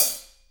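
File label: HH, hi-hat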